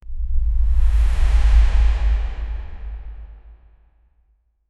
climatic soft whoosh; air

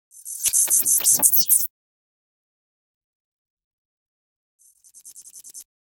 subtle transition swish

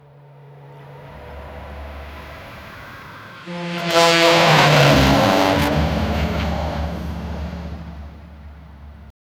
fpv drone whoosh fast; racing

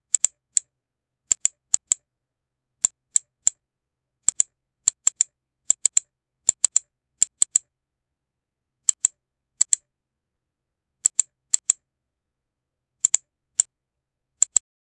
for matchcut transition i, camera shutter

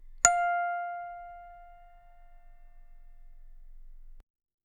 a clean dry audio recordi, handpan

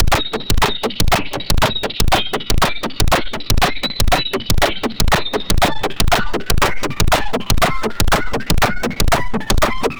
ooohh, human vocal

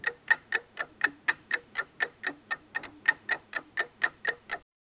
single dry metallic tick; antique

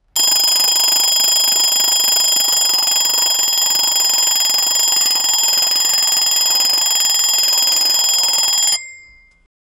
old mechanical alarm cloc

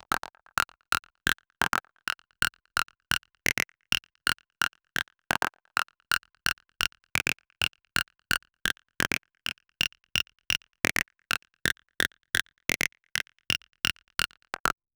mix of open hi hat and; bee

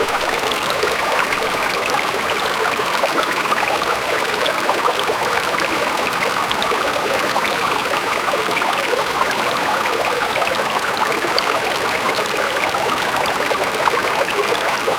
orinoco river anaconda in

